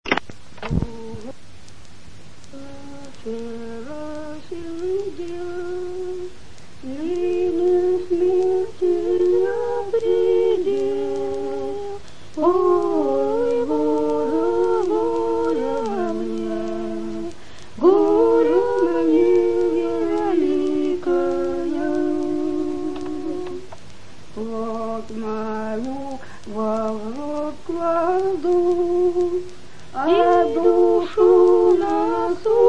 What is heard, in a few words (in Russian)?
Село Подсереднее (Белгород); С другом я вчера сидел